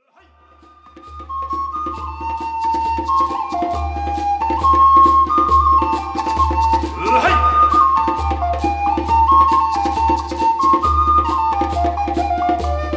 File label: indian flute